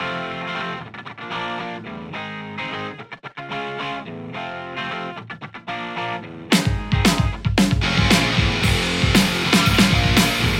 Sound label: Les Paul; rcvprod amplistortion